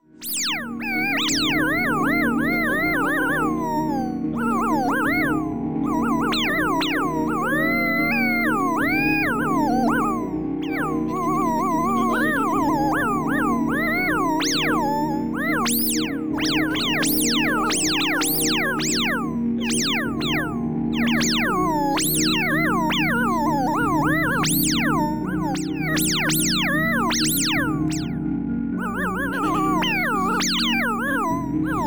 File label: improvise